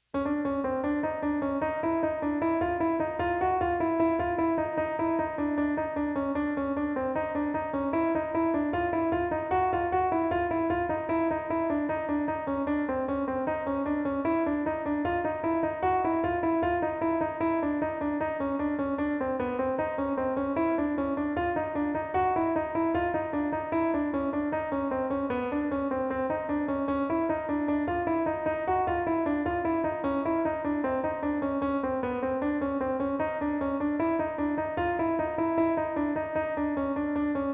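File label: Chromatic Scale Tone Embellishment, jazz piano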